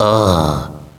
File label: auhhh, Echo inside caves, underground; DS; ML BOWSERS INSIDE; Luigi